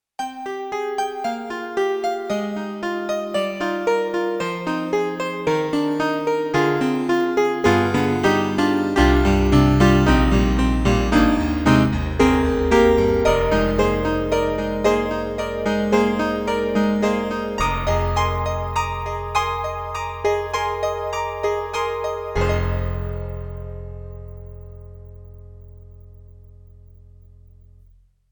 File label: E-MU Sound Engine, Electric Grand Piano